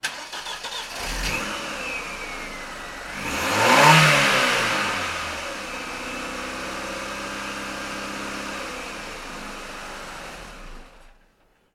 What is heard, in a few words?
car start rev off internal garage ford escort mk5; transportation; sound effects; sound effects one